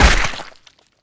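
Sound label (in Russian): поражение цели лазерным лучом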